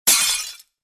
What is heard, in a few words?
Window breaking into shards